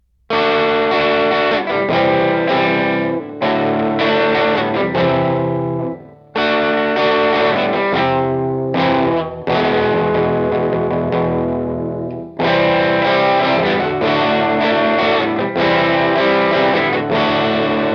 electric; MegaEpix

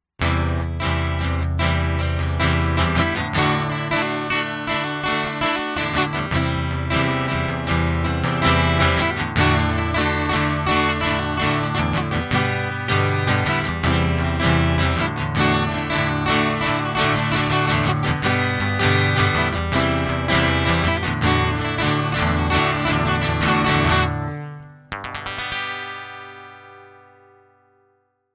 guitar intro; Playing in Your Head

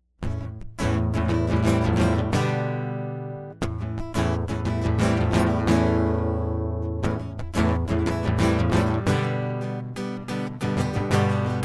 acoustic guitar

